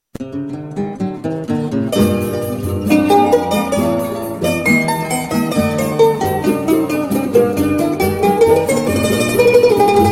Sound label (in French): bandolim; instruments